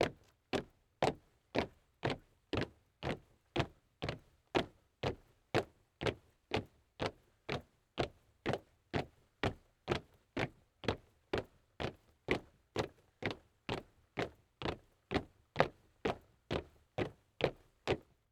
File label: Human Crowd Marching